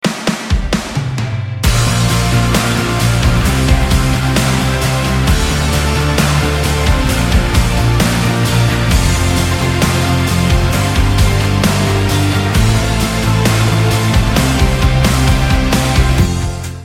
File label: rock intro